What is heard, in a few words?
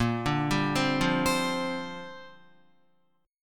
Bb 6th Add 9th Bb Guitar; Bb6add9 arpeggiated